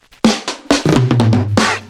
drumfill 126 funkster

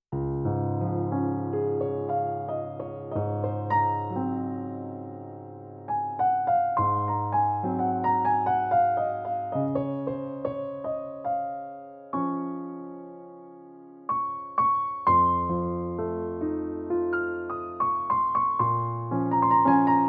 Vivo H1 Classic Grand; dexibell vivo h1 test

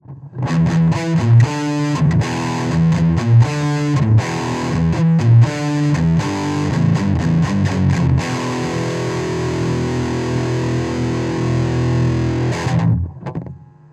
gain full

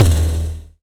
Tom

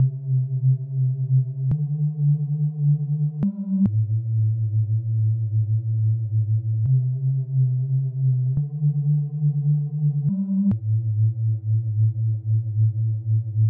osc b

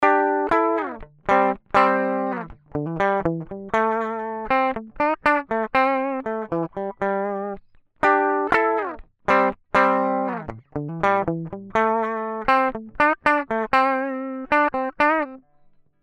two notes torpedo reload di output

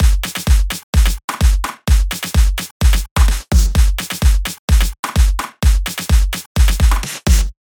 drums

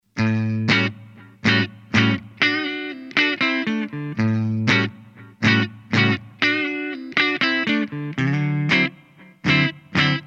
les paul clean; blackstar id core beam

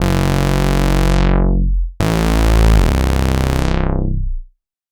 unison synth sound

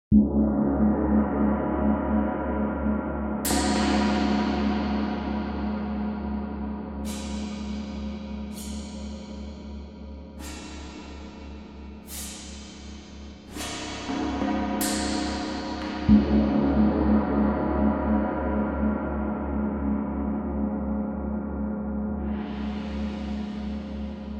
gong; best service ethno world 5

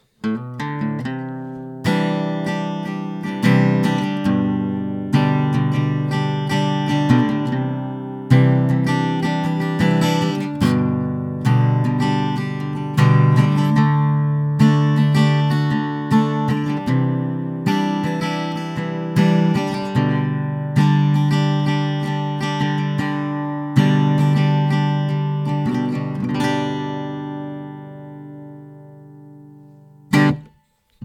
beyerdynamic m160 ac gtr

